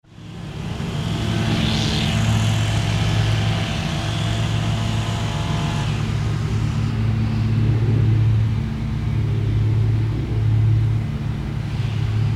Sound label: Lawn Mowing